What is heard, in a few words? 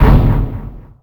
Menu Scifi